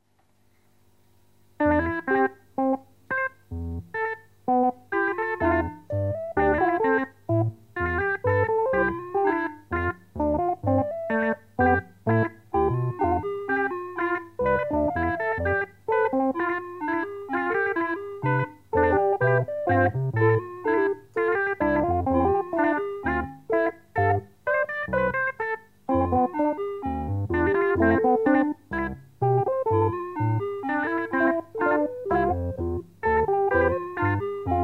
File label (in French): Synphonie pour 4 korg